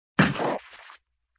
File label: Gloop Hit